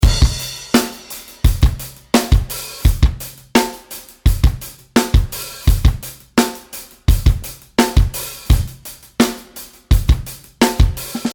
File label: Drums Character10